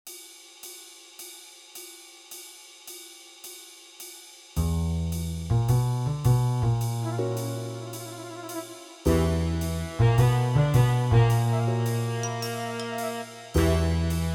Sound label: Background Music; Detective Barbie